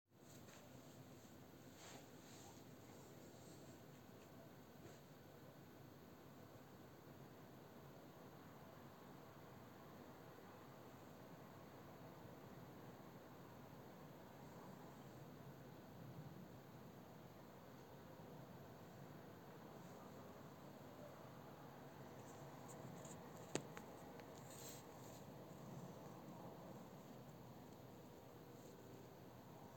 Background Noise

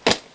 Block

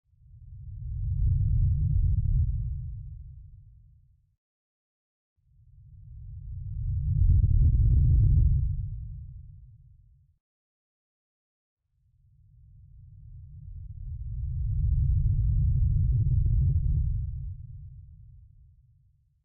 Low Sub Deep Whoosh Rumble

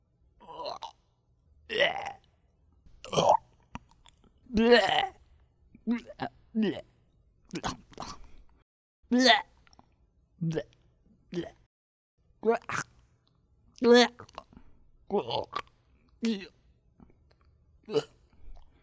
Gagging Sounds

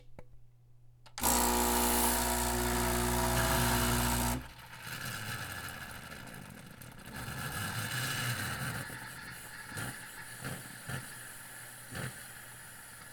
Powerup

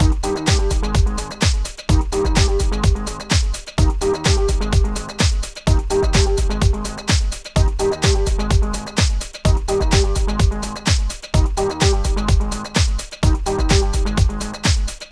House 127bpm

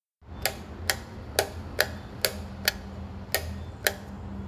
Light Switch

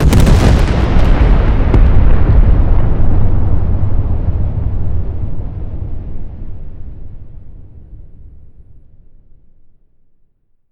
Astronomical Explosion

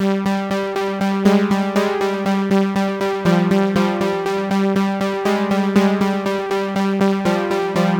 Polysynth

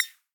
Click Pop

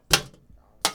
Two Metals Steps

